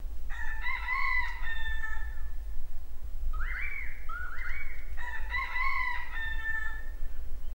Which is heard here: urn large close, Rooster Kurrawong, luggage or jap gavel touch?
Rooster Kurrawong